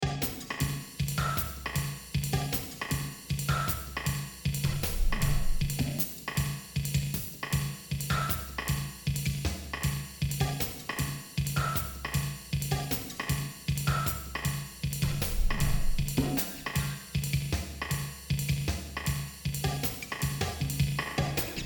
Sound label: drums